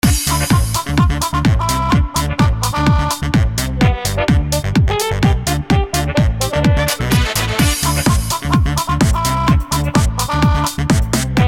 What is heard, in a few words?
free spin melody; Diamond Cats